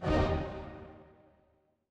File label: transition braam